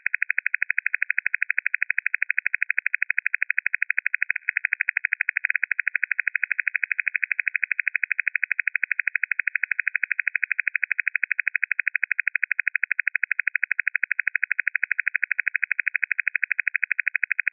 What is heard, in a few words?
othr bw 40k0e 12.5 sps